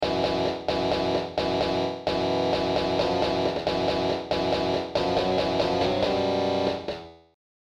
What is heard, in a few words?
main riff